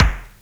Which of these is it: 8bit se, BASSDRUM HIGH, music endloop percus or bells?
BASSDRUM HIGH